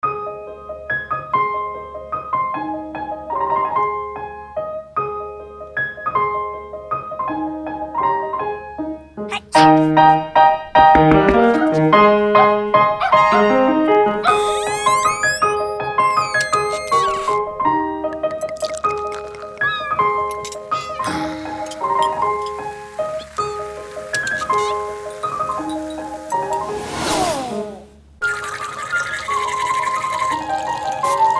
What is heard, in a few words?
Duo Instrumental